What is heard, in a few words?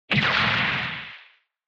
Retro Anime Gun Short